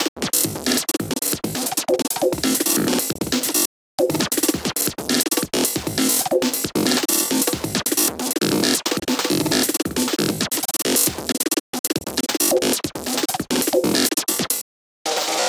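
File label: drum beat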